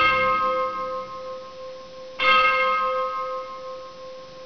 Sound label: bell